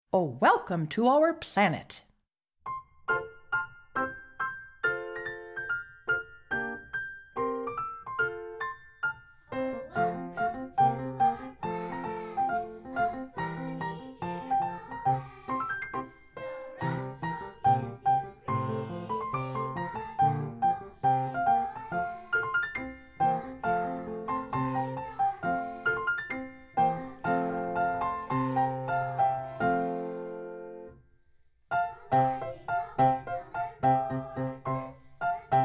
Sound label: piano